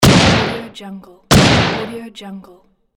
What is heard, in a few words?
desert eagle shot